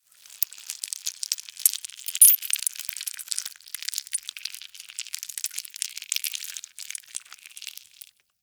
Larva Maggot Move, Larva, Maggot, Movement, Slimy, Insect, Bug; Larvae; Sound Effects:Animals:Insects; Blastwave